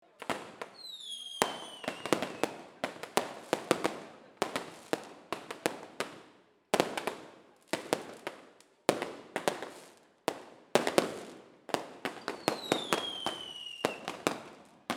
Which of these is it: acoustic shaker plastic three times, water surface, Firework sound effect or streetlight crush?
Firework sound effect